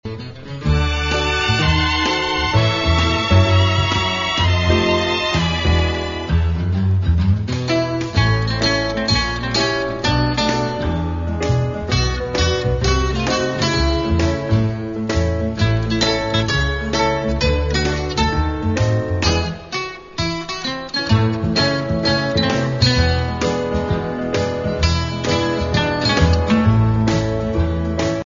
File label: Empty Pocket Blues